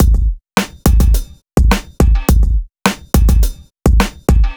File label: bass